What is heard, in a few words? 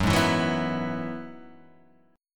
F sharp 7th Suspended 2nd Suspended 4th F sharp down Guitar Half Step Down